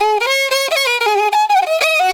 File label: riti grooves; Best Service; World Colours